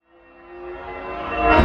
Metallic Riser

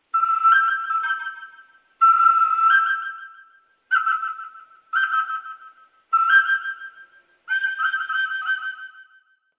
Bird

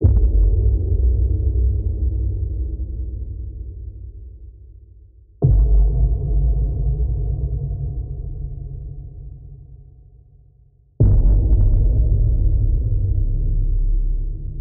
Cinematic Impact Boom Drama

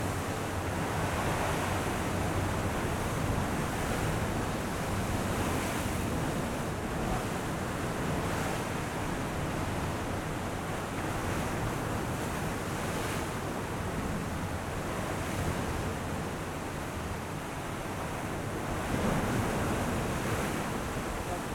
A Luxurious Yacht Driving Through The Sea

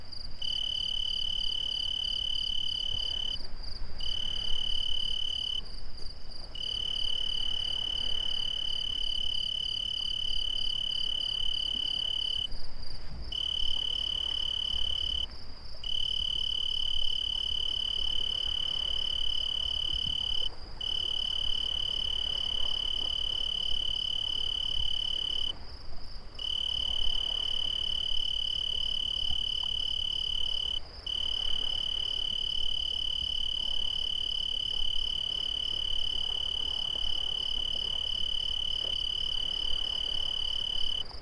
Two crickets

